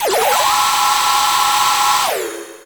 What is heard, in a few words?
sfx sci fi; Masterbits - Switch FX